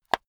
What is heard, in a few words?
watch case small close hard